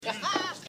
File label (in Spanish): risa